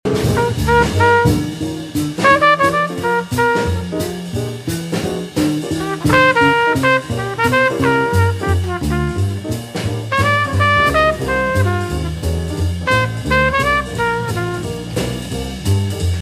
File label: Blues